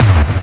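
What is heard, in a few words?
hard bass drums; breakcore; Bazzdrumz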